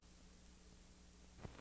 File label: line noise, Vinyl